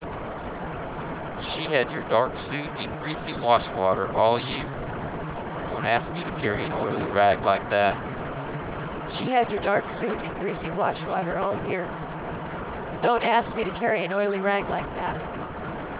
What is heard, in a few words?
car, noise